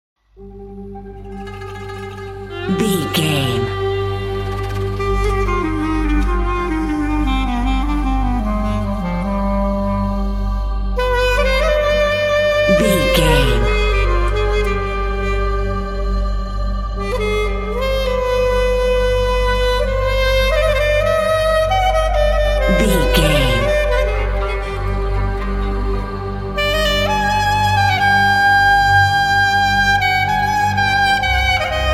arabic mystery

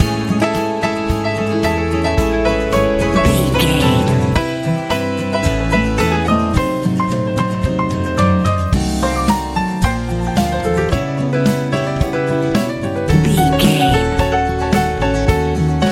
easy pop

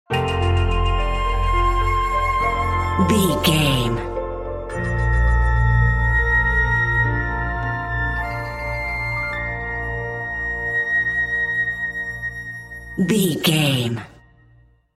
ancient temple ruins bumper

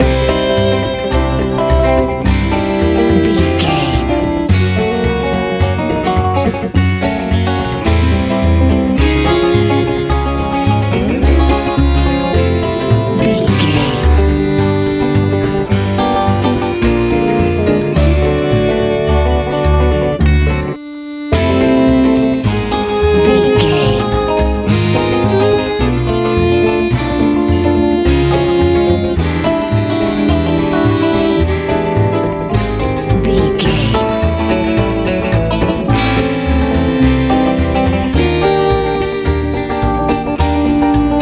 blooming hot rockable

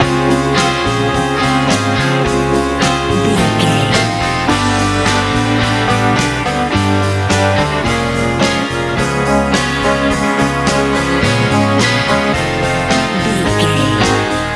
classic rock

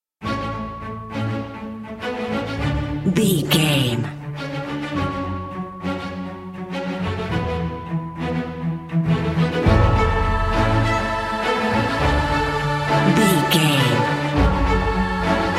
cinematic corporate bed

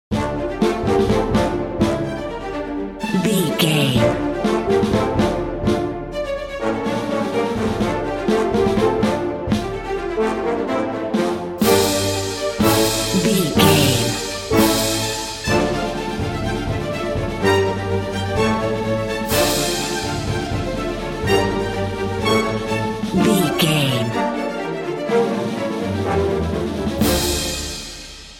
time running out

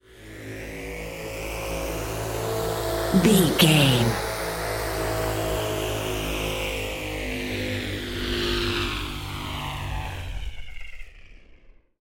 ominous war plane

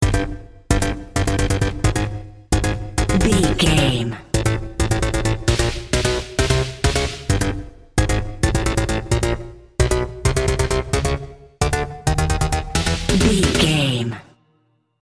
intense video game level

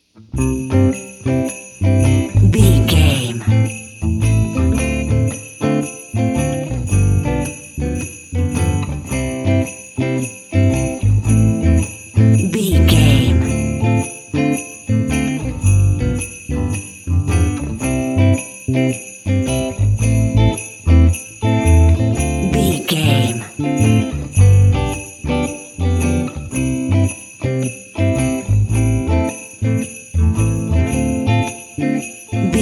missing you christmas bed

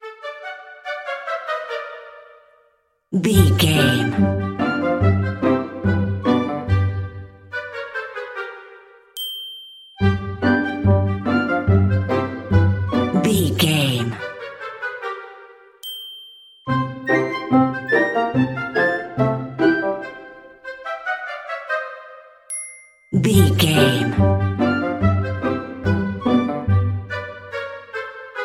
woodland critters play

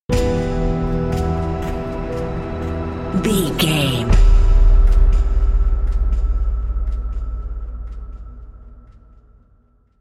alone and sad sting

